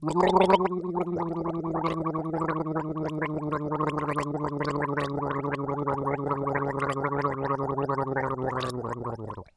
Human Male Gargling

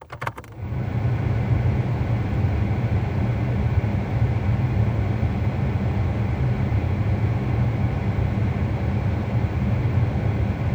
Auto Air Conditioner Level Four; Transportation

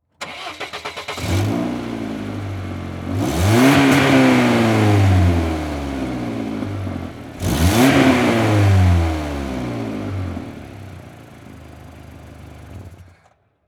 Auto Saturn Start Rev Shut Off Exterior; Transportation

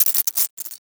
Title Transition Glass Element; Adobe; Production Element

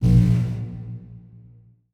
Multimedia Internet CD-Rom Flash Pop Up

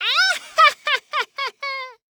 Horror Scary Witch Cackle

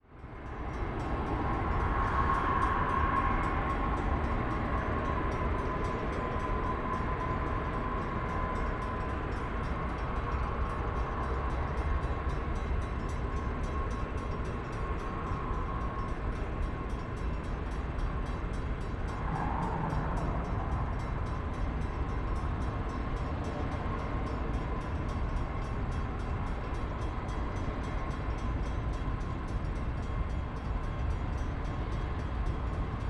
Ambience Train Near Road; AdobeCC

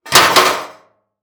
Impact Metal Drop On Concrete Bounces Twice; Impacts

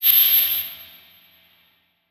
Multimedia Internet CD-Rom Flash Electronic

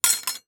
Industry Tool Crowbar Set On Pavement

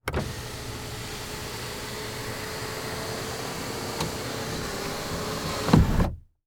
Chevy Trailblazer Close Sunroof Slow; transportation

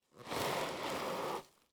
Foley Tire Skid In Dirt

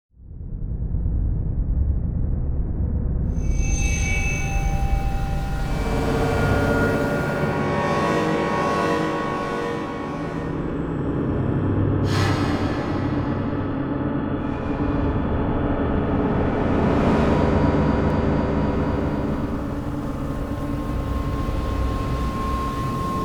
Electronic Whoosh Reverse Feedback Digital

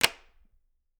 Household Refrigerator Magnet Put On Refrigerator

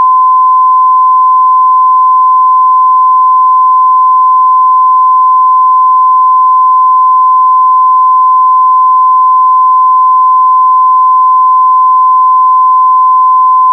1024hz Sine Wave

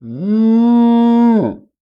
Animal Mammal Herbivore Cattle Bellow; animals; AdobeCC

